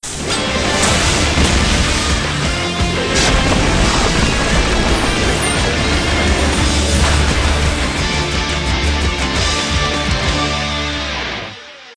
Battle Music; Zak Storm Super Pirate; mobile; Battle Dezer Music

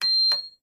Hammam Door Accepted

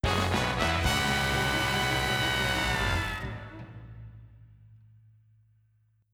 Surprise Sting; Paper Mario Color Splash

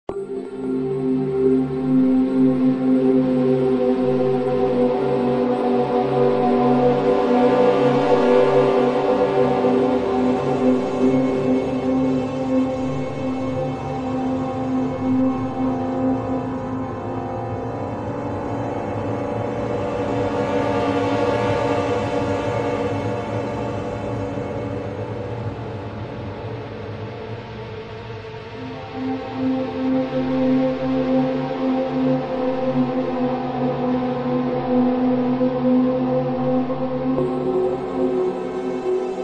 Horror

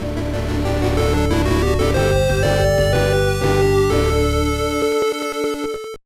Unlock Fanfare; game boy advance gamerip; metalgun slinger

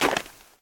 Step Snow

